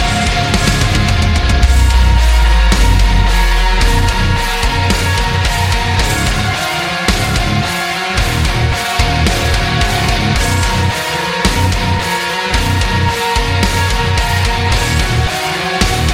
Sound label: powerful metal